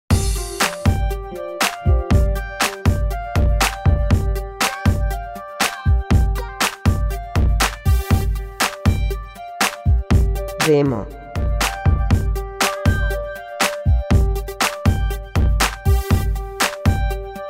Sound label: Pop